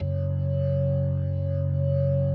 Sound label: B3, First Call Vintage Keyboards